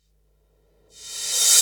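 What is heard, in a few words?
crash reverse, Dance Mega Drums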